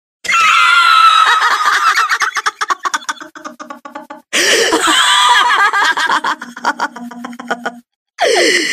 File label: laugh; cave